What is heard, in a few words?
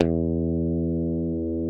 E 2 FUNK SFT, GUITARS, FUNK STRAT; Spectrasonics; Hans Zimmer Guitars VOL-1